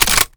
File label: kilo98 reload fast bolt close; gmod; viper